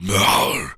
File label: Jump, Zombies; zps; Carrier